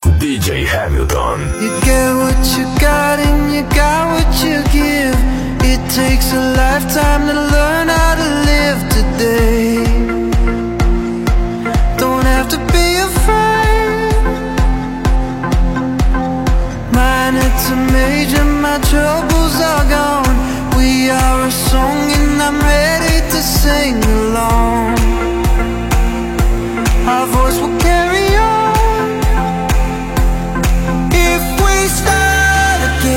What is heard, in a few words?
Essencial EDM